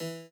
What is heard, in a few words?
chord e